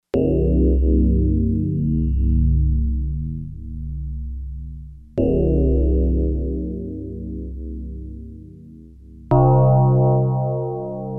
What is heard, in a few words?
Bell Wahh, Yamaha TX802; Performances; Factory Patches